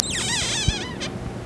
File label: SQUEAKY; SCARY